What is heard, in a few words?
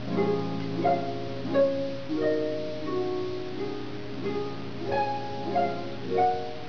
harp